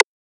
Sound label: Perc